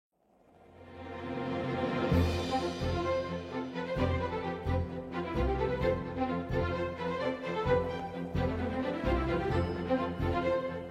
Animation Opening; APM Music; Brookhaven RP Opening Soundtrack

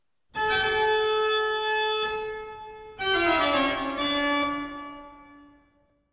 Toccata In D Minor; JS Bach; BWV 565

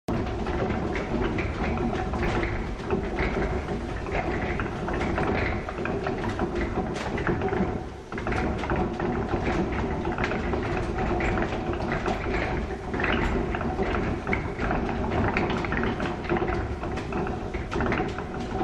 Wooden Gears Sound Effect

